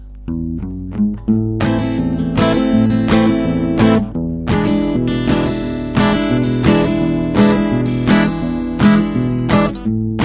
guitar; STALKER

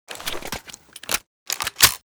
muzi reload; STALKER

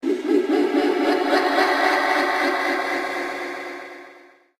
laugh; STALKER

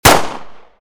scorpion fire; STALKER

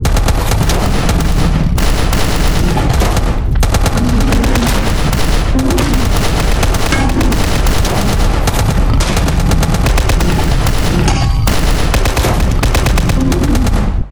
fightscene; STALKER

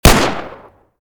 glock fire; STALKER

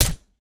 muzi silencer; STALKER

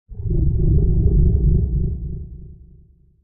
funnel idle; STALKER; somber anomaly

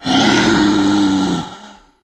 controller attack hit; STALKER; customnpcs

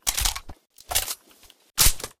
g3a3 reload; STALKER

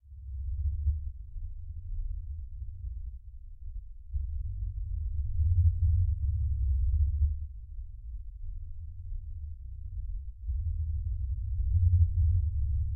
ambient dark; STALKER

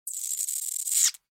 rat death; STALKER; customnpcs

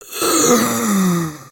death; STALKER; customnpcs; killer

